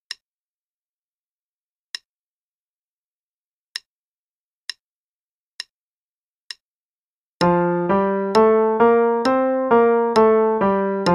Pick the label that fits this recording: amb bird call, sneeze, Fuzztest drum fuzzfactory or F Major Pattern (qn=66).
F Major Pattern (qn=66)